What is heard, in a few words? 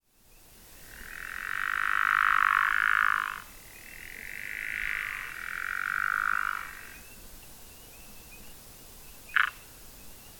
pickerel frog underwater